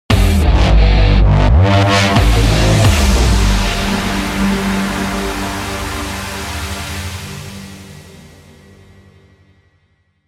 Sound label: Sting; Narco Terror gamerip 2013; Pile Driver 02